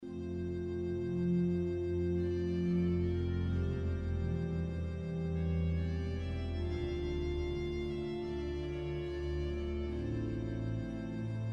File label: Physharm; Riga